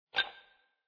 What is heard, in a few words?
tokens fall; fairies